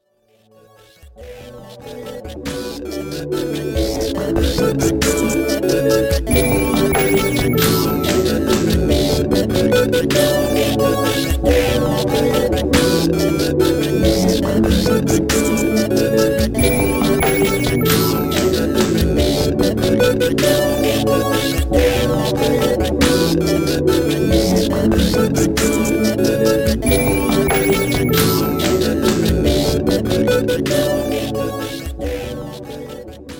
kaossilator noodlings joyous mess

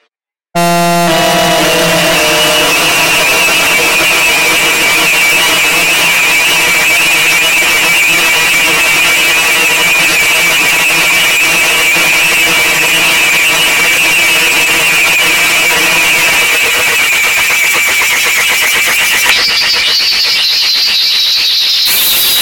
multiple effect loops in ableton

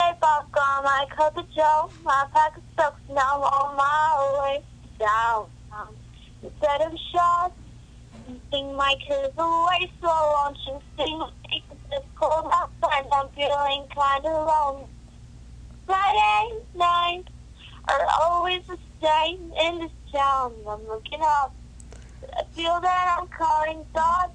singing over the phone

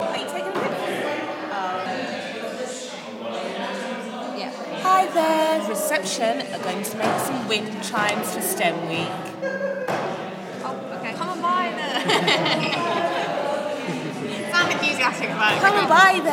windchimes

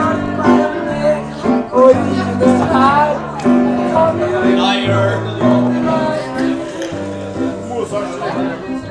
whoop

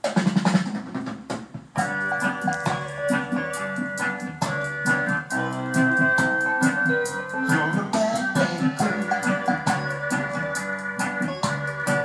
reggae

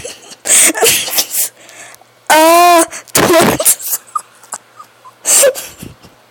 aaaaa

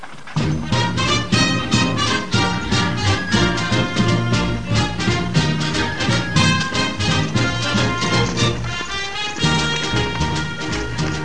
fanfare

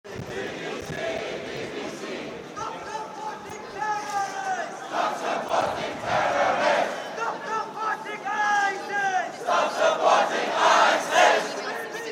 iraqis protest outside broadcasting house

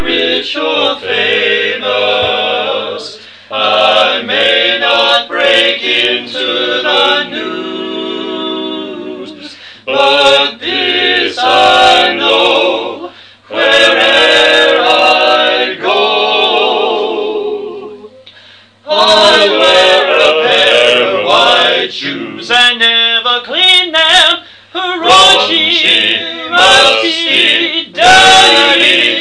white shoe blues

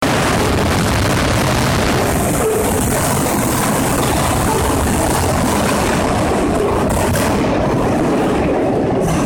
two seconds of amazing rhythmically train passing

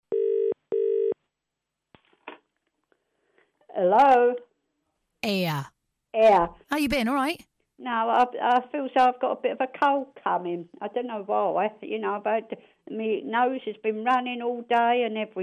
rain

